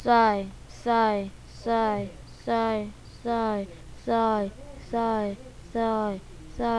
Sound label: sigh